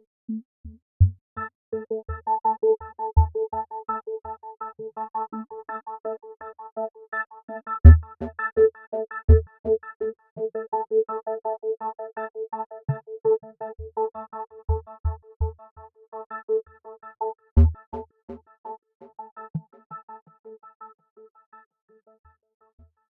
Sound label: Electronic Stars; Sci Fi Music; science fiction sound effect; star wars / trek style games